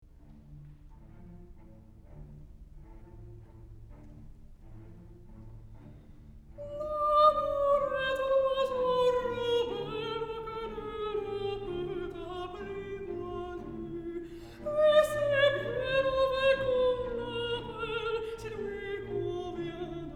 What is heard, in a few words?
female mezzosoprano habanera; carmen